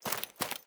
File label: Run